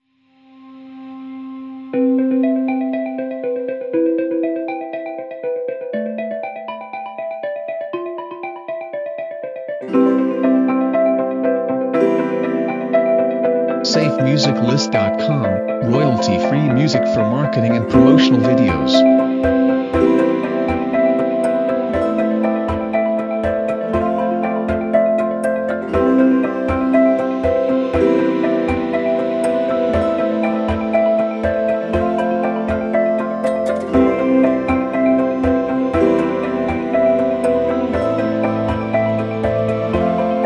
Ambience Of Inspiration; SafeMusicList

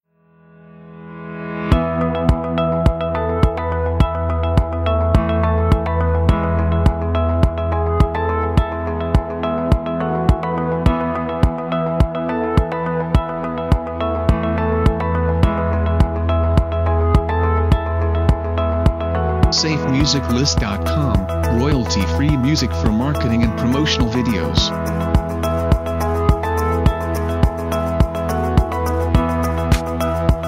Soft Ambient Corporate Theme

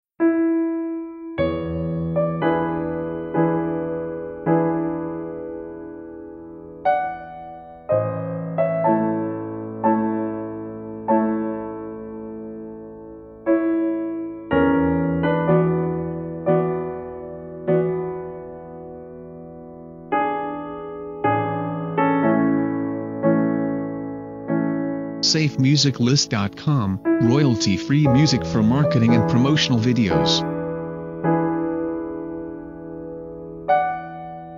Prelude In A Major; Chopin; SafeMusicList

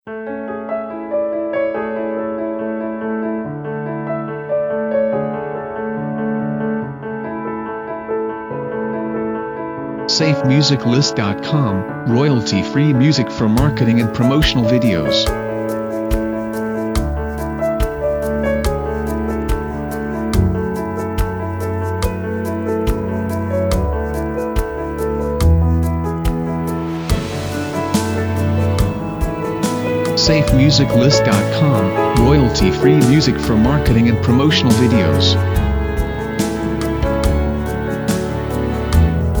Gentle Rivers; SafeMusicList

